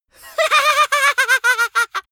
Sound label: goblin laughing, comedy cartoon vocals; soundpool